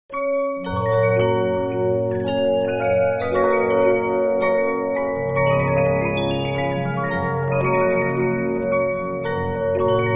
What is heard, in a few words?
Regina, 15inch disc musicbox